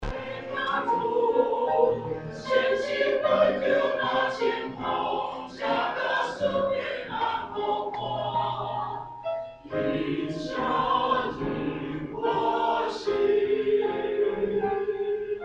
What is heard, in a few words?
Choir; taiwanese